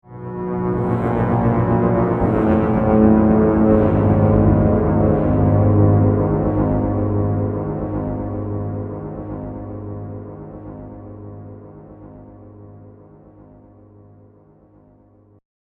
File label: Dramatic Horror Finale, scary tone sound effects; Andrew Fleming